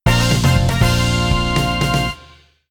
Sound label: fanfare race music; VR Karts